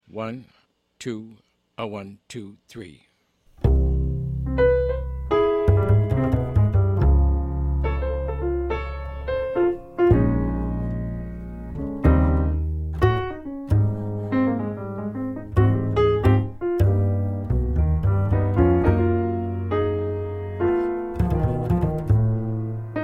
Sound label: slower minus drums